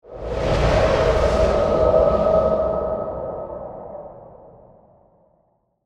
Ghost, Spiritual, Encounter, Horror, Whoosh, Airy, Haunting; Blast Wave